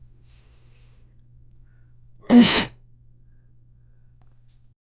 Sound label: sneeze